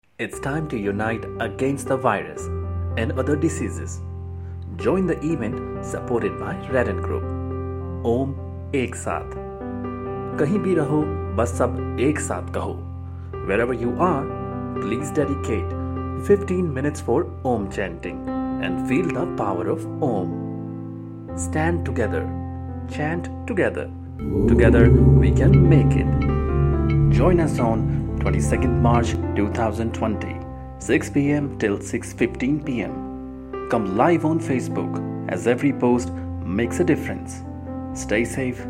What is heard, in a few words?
Hindi Om Chanting